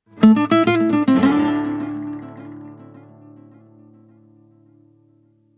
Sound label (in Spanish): AUDIOLOGO